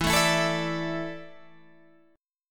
E 7th Suspended 4th, Guitar, Half Step Down

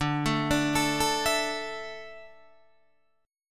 D Suspended 2nd D down Guitar DADGAD

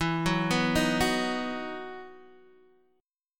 E Major 7th Suspended 2nd, Guitar, Open D

